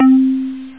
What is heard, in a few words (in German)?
crazy holzblock